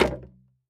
Foley Footstep Golf Cleats Jump On Wood Board Walk Single; Foley Footsteps